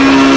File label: simulated vehicles low